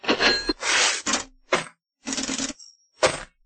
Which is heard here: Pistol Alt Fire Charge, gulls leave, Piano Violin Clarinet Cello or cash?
cash